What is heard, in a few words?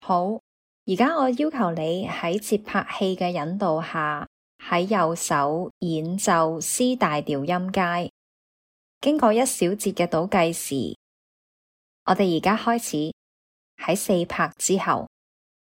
c major scale rh